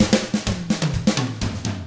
127 Drums

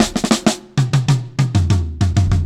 Brown Kit 1-Bar Fill, Drum n Bass; KJ Sawka - Drum n Bass & Beyond; Beats and Percussion

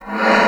AIR, BASSOON; Xsample VOL-6

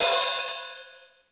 HI-HAT OP; East West; Global Sounds; R8M

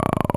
OWWWWWWW; E-MU Formula 4000 Series Vol. 1; Hip Hop Nation; Phatt Planet

